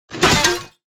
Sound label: drop flowerpot